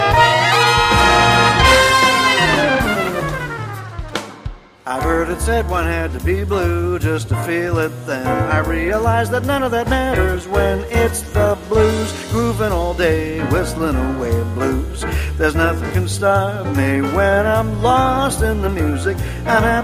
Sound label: Blues